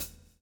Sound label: swing